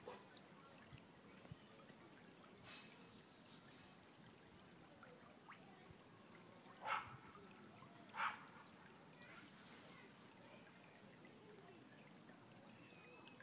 sound of the stream dog barking and children playing; elmdon park